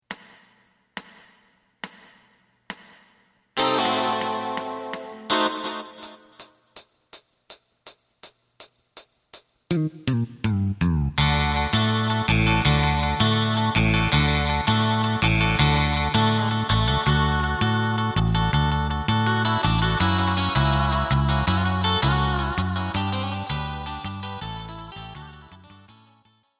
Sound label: drum